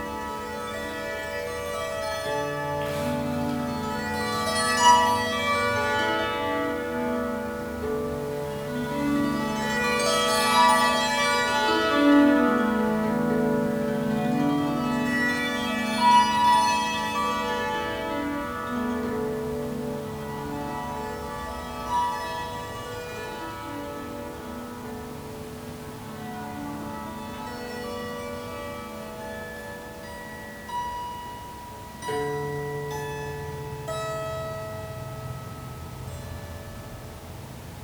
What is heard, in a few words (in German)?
Bass Tao Leier Klangbeispiel